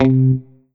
Korg 01W Mute Guitar C3

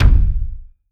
Floor Tom

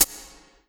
Yamaha TG100 Pedal Hi Hat

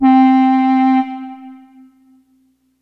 Ensoniq SQ-1 Clarinet C4

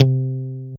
Casio CTK-611 Muted Guitar C3

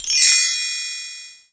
Roland R-8 Bell Tree

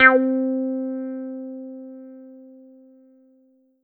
Roland SR-JV80 Duck Clav C4

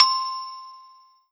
Ensoniq ZR-76 Glockenspiel C6

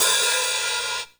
Ensoniq SQ-1 Open Hi Hat

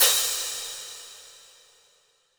Crash Cymbal

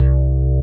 Korg MS2000 Super Bass C2

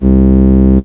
Yamaha PSS-280 Reed Organ C2

